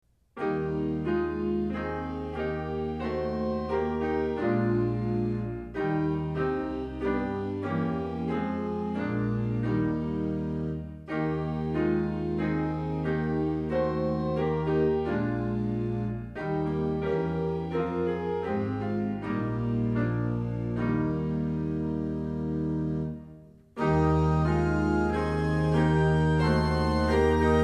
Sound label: SDA HYMNAL SONGS TUNE